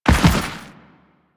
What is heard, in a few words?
Land Dirt, Footsteps; Warframe; Teshin